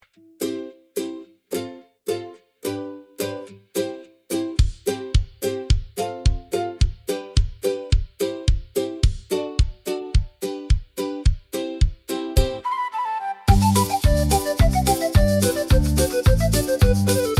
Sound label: Instrumental